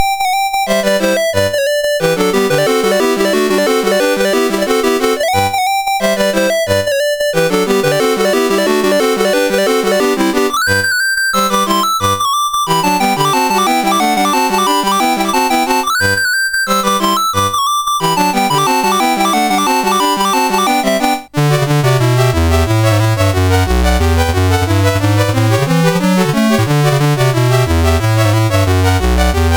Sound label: Trepak Russian Dance, CSG8580R5; Dave Marquis